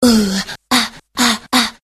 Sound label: ohh ahh